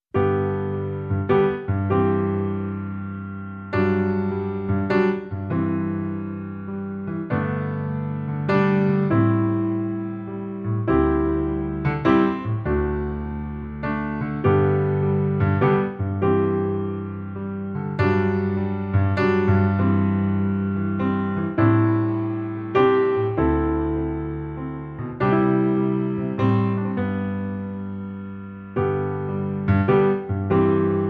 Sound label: Piano Only